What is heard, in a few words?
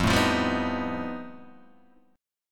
F 9th Flat 5th F down Guitar Open G